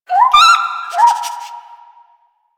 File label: Rabbit Ambient Call; Duviri; Animals